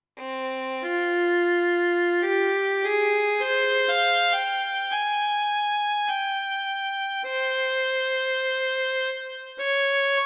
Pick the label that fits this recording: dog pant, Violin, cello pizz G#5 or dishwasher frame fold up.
Violin